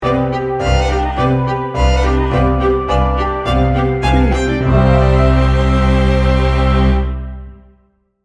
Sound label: baroque sting bumper